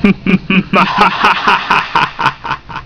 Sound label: Party Loss; Realmz 2.2